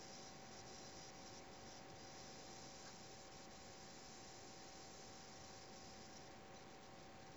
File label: noise